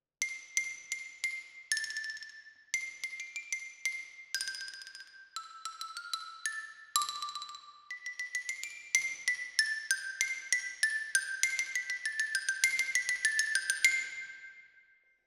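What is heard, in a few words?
Xylophone Close, Jazz for piano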